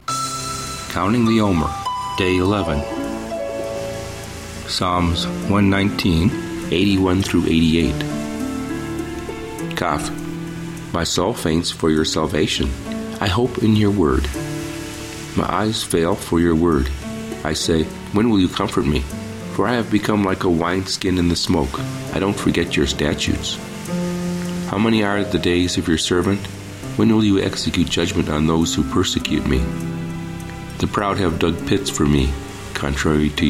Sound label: Meditative w Waves Ocean; Omer; Psa119